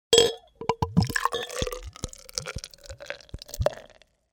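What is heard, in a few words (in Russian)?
вариант сильного опрокидывания; Звук наливания пива; Наливания из бутылки, из банки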